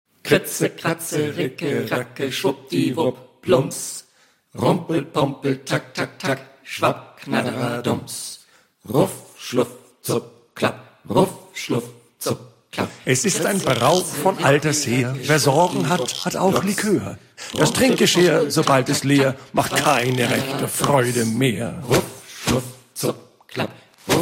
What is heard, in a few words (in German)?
Rap